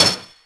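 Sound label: sw glass 2; Thief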